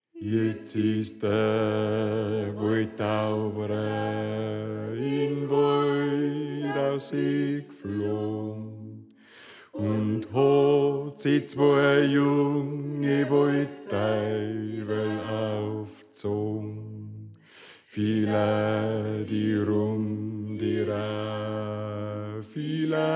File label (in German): Bass; Iatz Is Da Woidtaubra